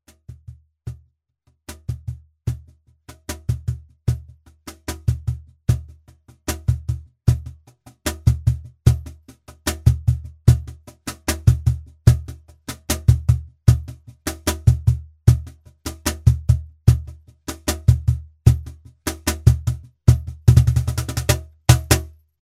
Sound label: CAJON, flamenco